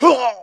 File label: hit, pain; mohaa